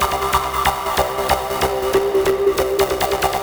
Paging; Сэмпл синтезатора (Техно-транс); Techno Trance; 140BPM; loop; Synth

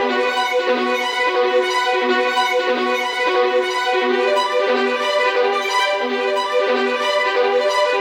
Spiccato Violin

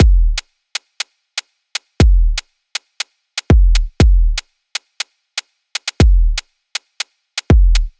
Bling Kit B

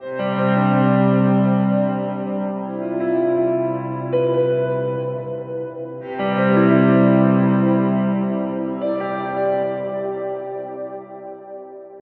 Moony, сэмпл клавишных ambient

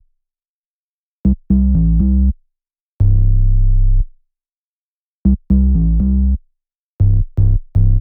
Agressive; Electro Dub

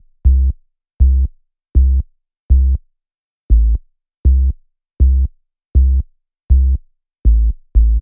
Triangle; Сэмплы баса (Electro Dub); 120 BPM; Bass

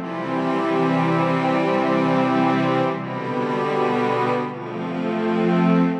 Chord Strings; Downbeat

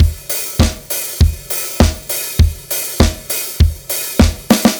Charisma Drums; Metal (Метал)

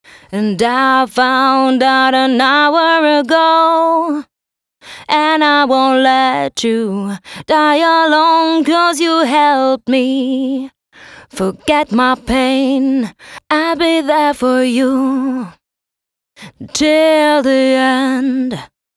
Chorus Female, женский вокал

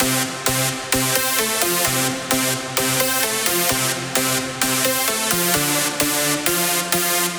Dance Lead; Сэмпл синтезатора; Electric Jazz; 130 BPM; loop; Synth; Электро Джаз

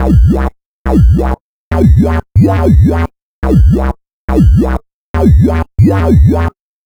J Map, dubstep

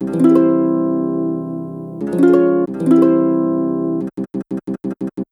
harp; звук гитары хип хоп strum harp

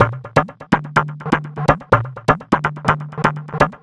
Biz Tabla, big beat

